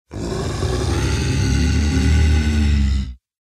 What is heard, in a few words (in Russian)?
preduprejdaushee ryshanie